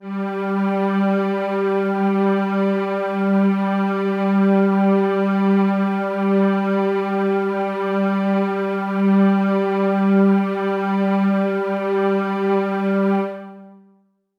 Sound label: Warm Strings Kawaii G2; pads